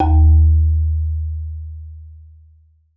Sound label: Marimba D#1; Sampleheads - Dave Samuels Marimba & Vibes